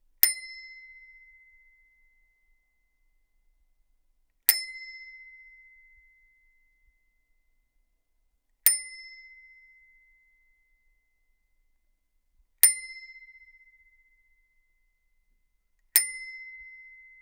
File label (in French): dring